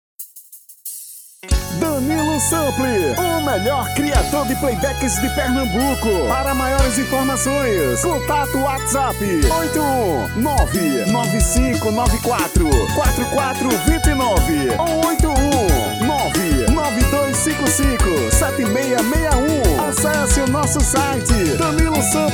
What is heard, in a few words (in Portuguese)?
Xote